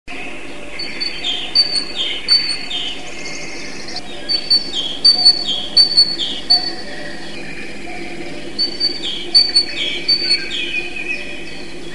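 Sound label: great tit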